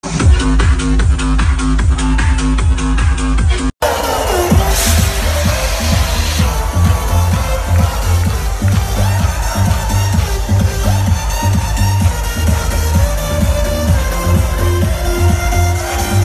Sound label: trance musical party ringtone; tomorowland